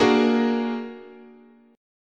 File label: Asharp Augmented 7th, chord, guitar